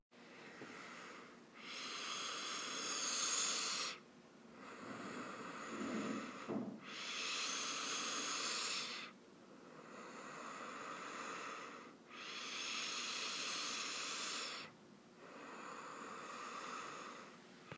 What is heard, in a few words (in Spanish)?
estenosis traqueal